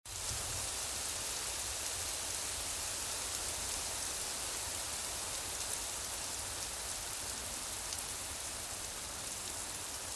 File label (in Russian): melkij dozhdik; Звук дождя; Звуки дождя сильного, моросящего